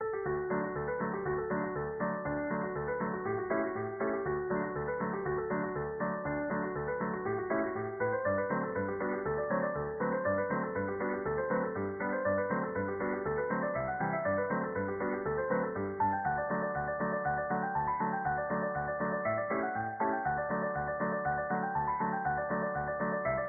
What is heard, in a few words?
Chorus Jig